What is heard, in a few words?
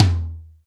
TOM, Drums; Best Service; Real Mega Drums VOL-1